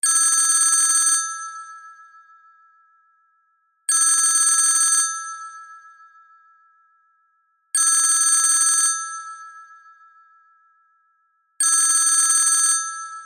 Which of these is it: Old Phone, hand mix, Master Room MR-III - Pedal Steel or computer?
Old Phone